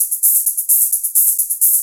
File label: drum 130 hihat, Techno Trance, Drumloops; Techno Trance Essentials